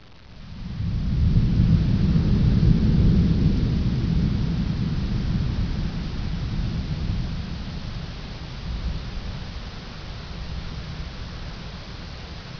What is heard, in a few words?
thunderstorm